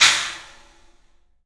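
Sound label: CLUB CYMBALS; 3D Sound; 3D Drums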